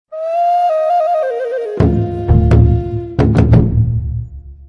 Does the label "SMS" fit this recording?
Yes